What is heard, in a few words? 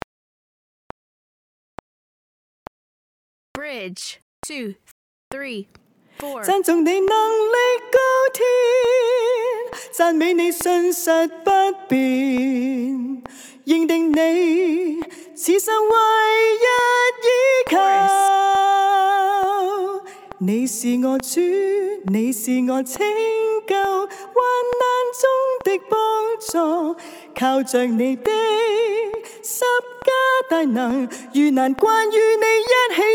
Practice Track bridge chorus